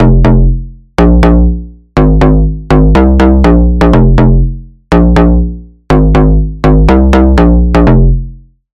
Bass; Grey Smoke; Routed Sound; Vital Expansion - Noble Gangster Vol.1

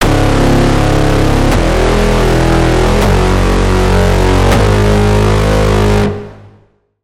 Braam - Doomsday; Routed Sound; Vital Expansion - Quantum Enforcer Vol.1

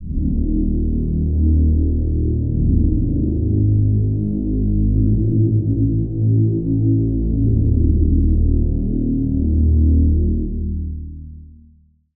Pad; Unholy Blight; Routed Sound; Serum 2 Expansion - Doom Carpenter Vol.1

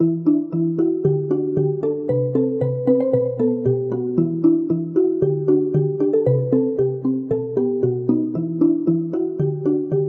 Pluck; Chubby Bob; Routed Sound; Vital Expansion - Story Walker Vol.1

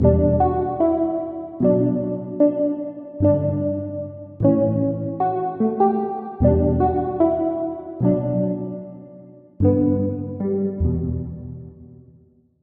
Key; Routed Sound; Serum 2 Expansion - Street Vanquisher Vol.1

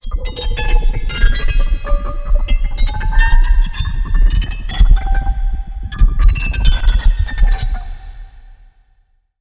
SFX Blacksmith; Sylenth1; Routed Sound; Sylenth1 Expansion - Quantum Enforcer Vol.1

